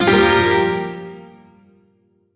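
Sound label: Shawzin Minor Chord; Music; Warframe; Duviri; Chords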